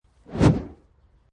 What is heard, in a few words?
Fire Whoosh Single, Short fire whoosh; Flames; Sound Design